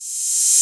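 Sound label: Boss DR-202 percussion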